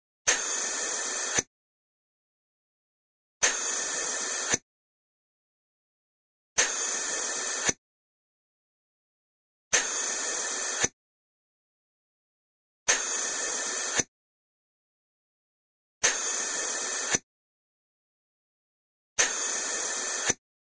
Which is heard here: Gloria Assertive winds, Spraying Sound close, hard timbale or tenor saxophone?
Spraying Sound close